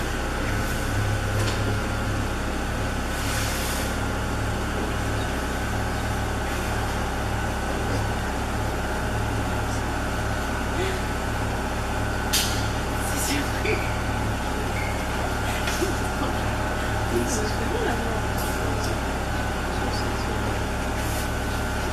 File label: ambiance, Metro